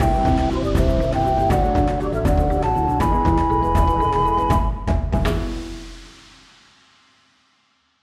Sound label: Win Level; Zuma's Revenge